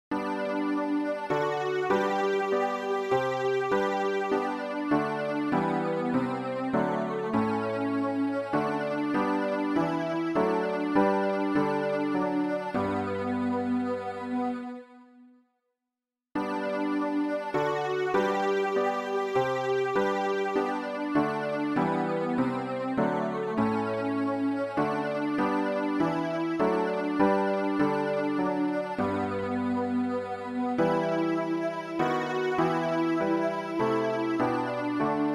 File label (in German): alt, Übehilfen für das Erlernen von Liedern; ref gesangbuch; rg 259 licht das in die welt gekommen